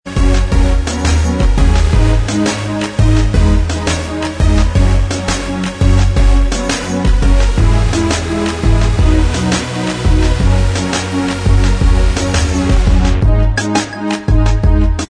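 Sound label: instrumental, backing tracks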